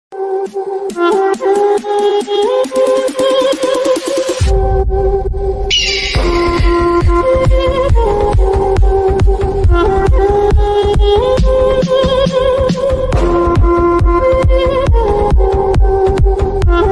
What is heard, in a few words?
bgm ringtone english ringtone; eagle